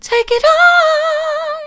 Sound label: take it on soft, vocal